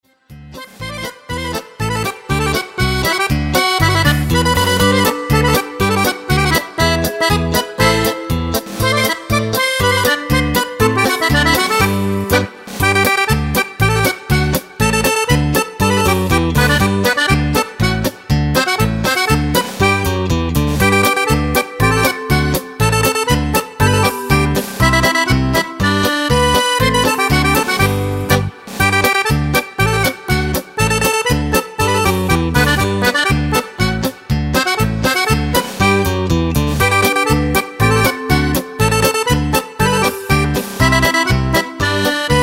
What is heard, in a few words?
polka